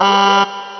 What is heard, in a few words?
Alarm; Playable Tutorial Level; TR3